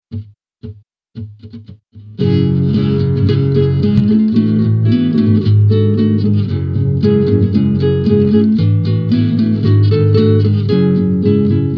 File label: Acoustic